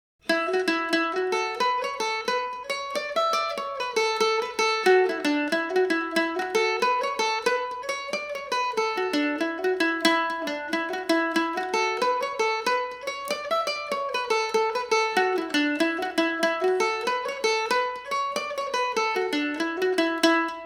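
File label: E dorian, irish mandolin; humours of castlecomer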